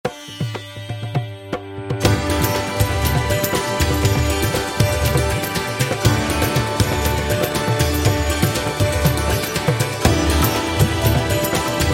Indian Festive

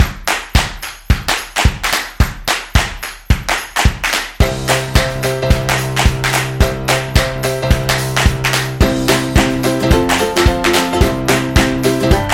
Holiday Fun, happy music

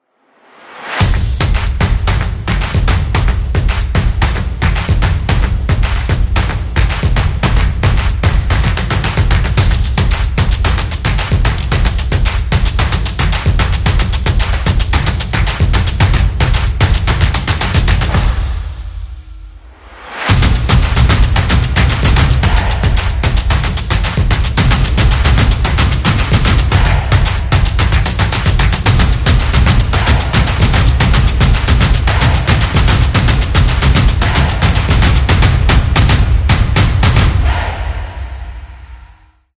Drum Beat Intro, stomp

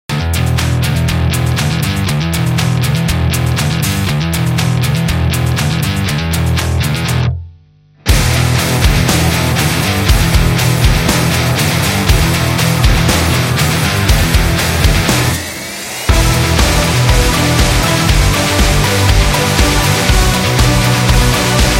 Indie Rock Upbeat

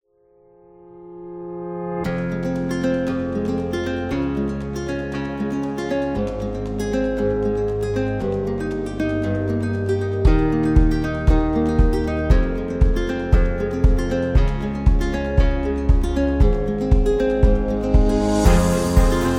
Love Guitar, wedding music

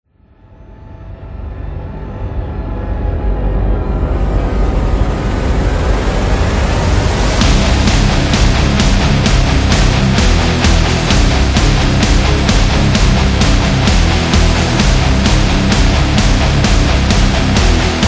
Evil Agressive Death Metal

